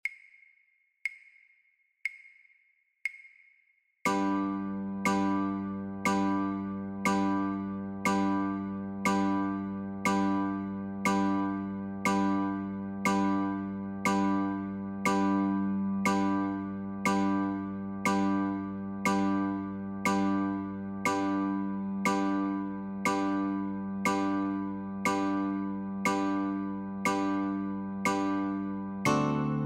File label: Chords only, guitar